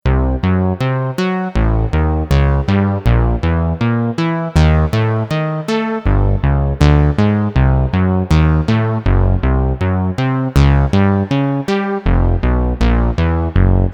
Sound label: arp phrase; vexations